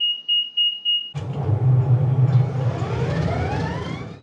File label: Start Up Warning; RailPro